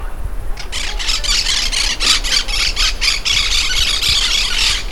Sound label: Myiopsitta monachus